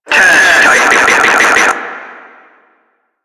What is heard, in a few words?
Tentacle Swing; Warframe; Proto Infested Ancient; Abilities